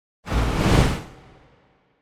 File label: Whoosh Sci-Fi Wind - The Last Wave; Hollywood Edge; sound designer's toolkit v2